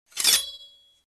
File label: katana Sound